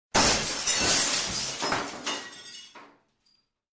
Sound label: Glass Breaking Sound Effect